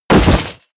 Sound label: bodydrop armor